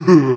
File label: thief happy; Politburo